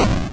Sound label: 8bit kit explosion; LO FI and 8 BIT KITS; 8 Bit Videogame Kit